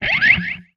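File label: Music Guitar Pick Scrape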